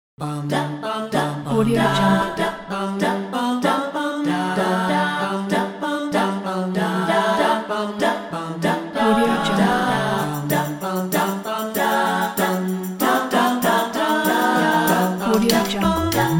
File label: funny tune; murreymoon